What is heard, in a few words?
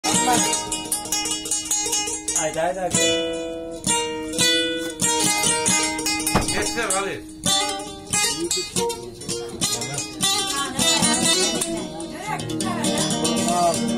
gitara version